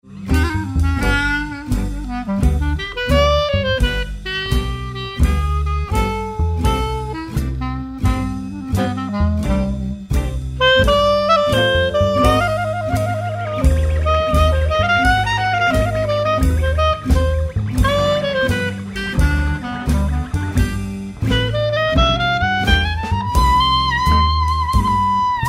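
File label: clarinet, jazz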